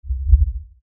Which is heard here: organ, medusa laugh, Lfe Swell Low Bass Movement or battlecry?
Lfe Swell Low Bass Movement